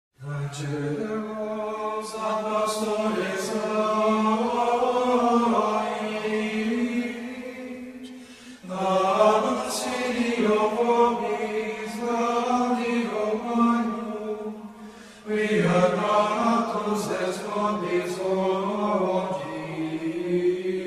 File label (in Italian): Gregoriano